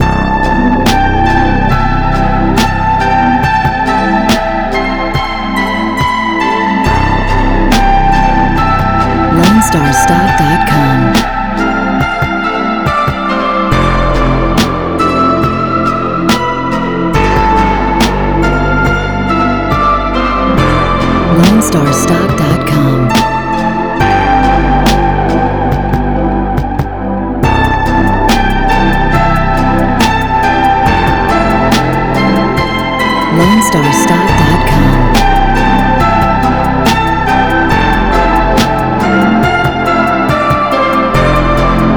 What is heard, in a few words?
Emotional Traffic; I35